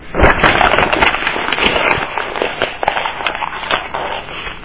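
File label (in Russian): сминают очень большую бумажную обертку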